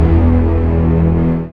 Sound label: SYN STRIN, dance synth; Sound & Vision - Gigapack I CD 2